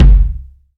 subby bass drum sample f sharp key, Royality free bass drum sound tuned to the F# note; kick drum; kick drum samples